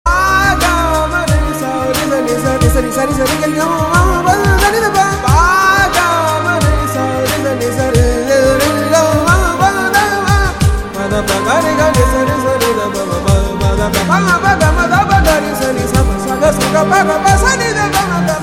Humming

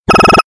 Vibrate Bleep

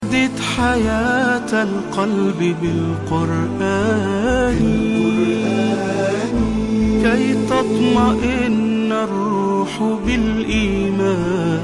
islamic

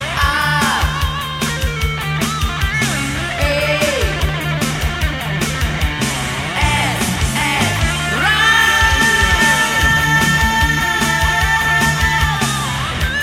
Instrumental

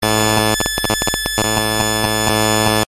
Gsm Distortion

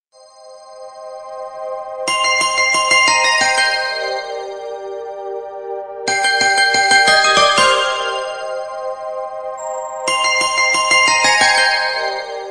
Ambient Mood

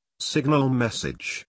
Signal message

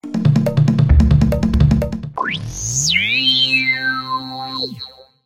Alarm Sound

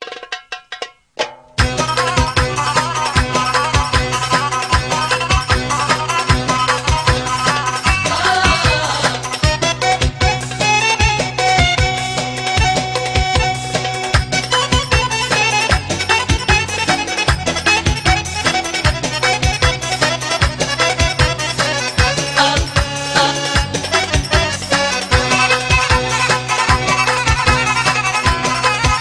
Arabic Dance

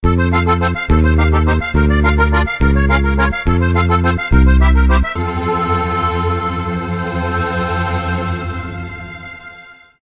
accordion

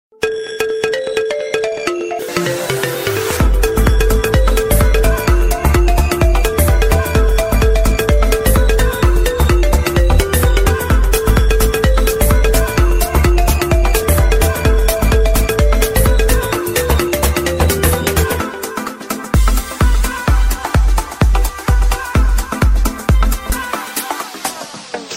Dance

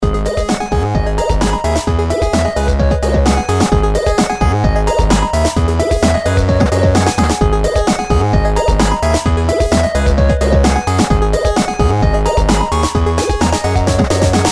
Best Digital

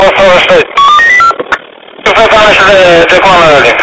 Police Radio Short

